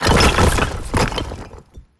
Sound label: wall destroyed; cstrike; pubg